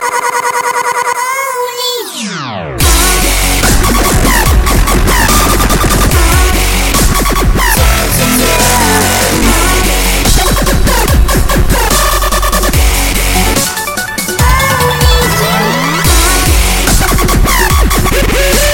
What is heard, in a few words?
iphone dubstep ringtone